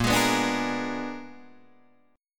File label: A sharp 7th Flat 9th, Guitar Open D chord